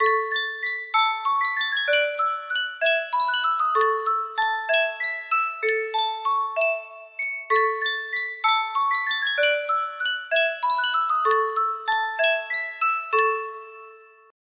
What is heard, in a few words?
Sankyo music box